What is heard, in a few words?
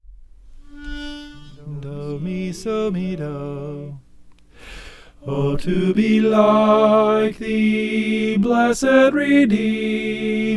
soprano